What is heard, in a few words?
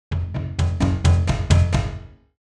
Cartoon angry walk